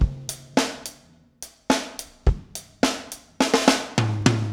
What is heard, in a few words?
Garage Funk Drums Fill, 70s Analog Funk; Sonic Foundry (Sony Creative Software); What it Is!